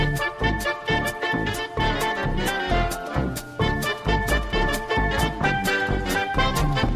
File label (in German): Barmusik; Star Wars